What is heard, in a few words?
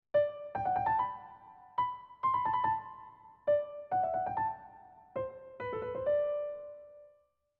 motives; 04 - G Major 2/4